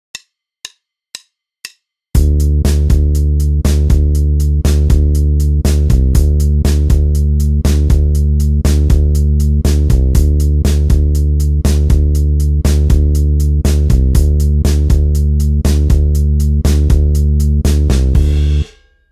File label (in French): debutants basse batterie